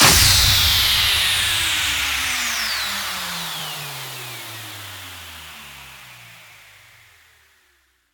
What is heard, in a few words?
TRAP LOOP END bpm134